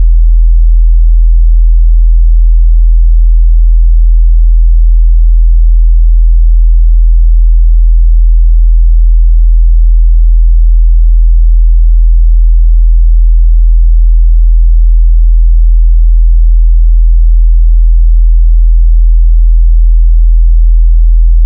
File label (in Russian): Сигнал 41.20 Герц (E) для настройки бас гитары